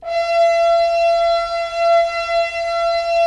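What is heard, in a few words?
Solo French Horn; Roland - LA Composer vol. 4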